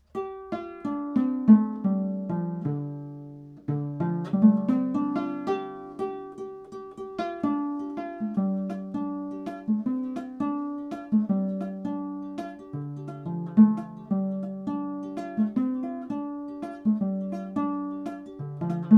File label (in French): ngoni huit cordes aigu